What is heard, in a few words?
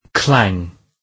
clang